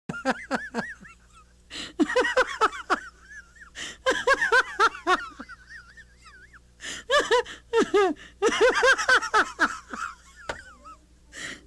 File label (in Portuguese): RISADA